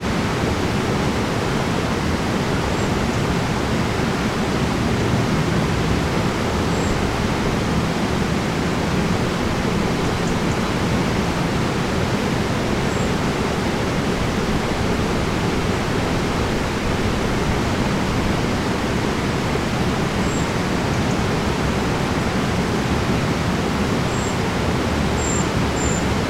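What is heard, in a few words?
ambience countryside distant weir birds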